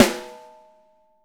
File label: 6X12 ALUMNUM, Living Drums; Sampleheads; Peter Erskine